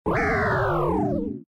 super jump release

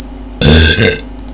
burp

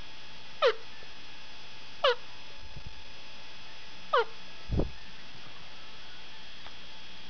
cluck, turkey calling

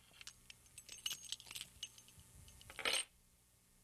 keys

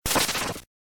destroy block

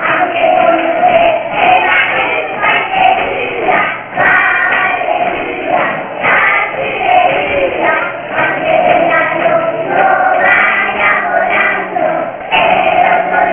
Kid Sing

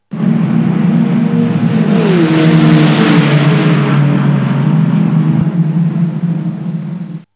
car race

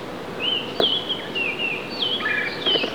oriole baltimore; birdsongs

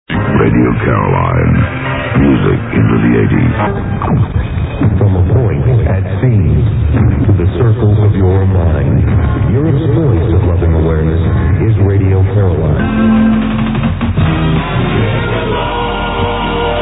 jingle mix

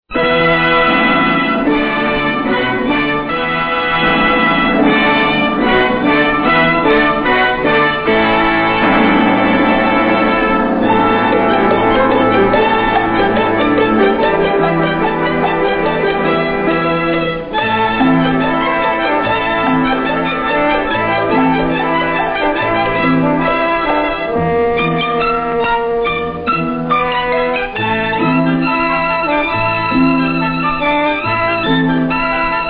chest

